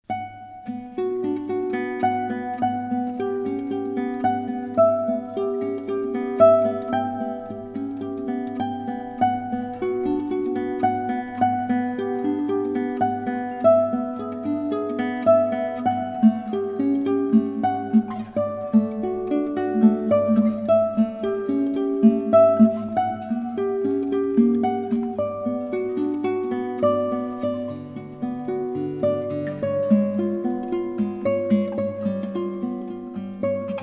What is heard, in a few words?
Solo Guitar